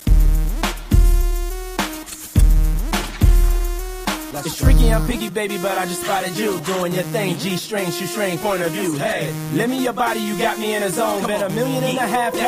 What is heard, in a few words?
Electro Transition 107 to 124